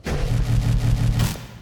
reaper throw; HLD

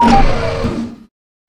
Janitor Shoot; Risk of Rain

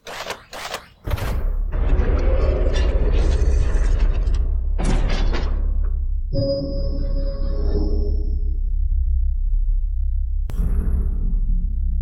pillar activation; HLD

